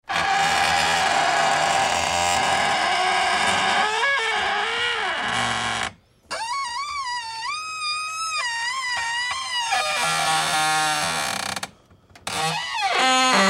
Squeaks Most Horrid, Wood plastic metal straining creaks